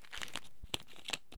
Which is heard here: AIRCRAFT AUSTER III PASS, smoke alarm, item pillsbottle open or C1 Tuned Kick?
item pillsbottle open